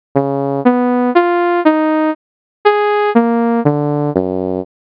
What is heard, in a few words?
Envelope AHDSR